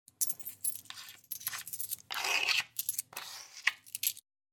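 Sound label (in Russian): picking up coins from a counter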